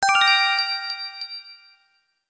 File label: savepoint; easyrpg; WitchsHeart